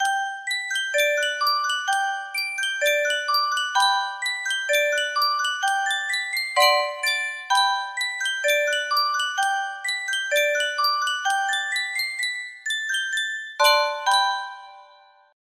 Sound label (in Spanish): Yunsheng caja de musica, music box melody